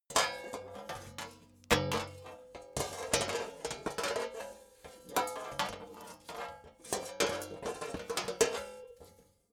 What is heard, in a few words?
metal plates handling jingle